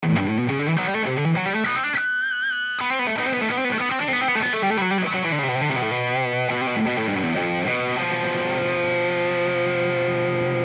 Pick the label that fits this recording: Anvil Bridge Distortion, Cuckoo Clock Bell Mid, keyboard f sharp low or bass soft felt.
Anvil Bridge Distortion